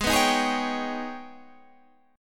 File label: G sharp Minor Major 11th